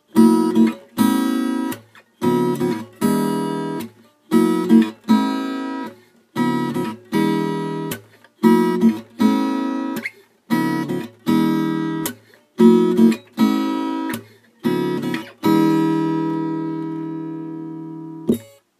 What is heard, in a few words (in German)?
open string voicings beispiel dominant